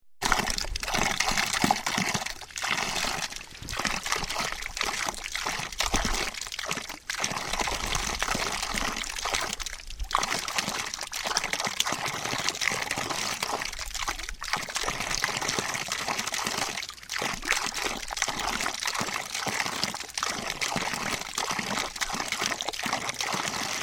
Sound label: Fountain; Fontana